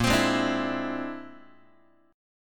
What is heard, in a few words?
A sharp 9th Flat 5th A sharp, Guitar; strummed; JGuitar